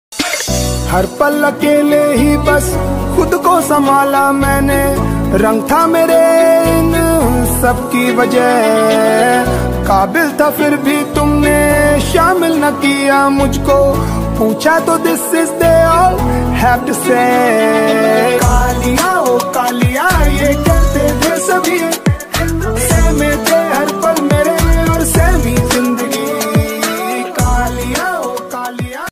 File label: hindi rap ringtone